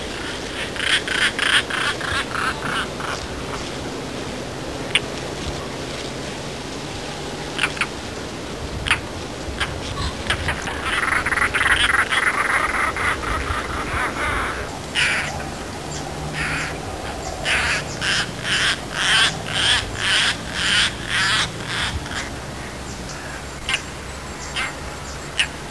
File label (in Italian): Ghiandaia marina